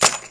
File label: Key Drop Shuffle